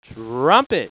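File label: trumpet; My Science Fair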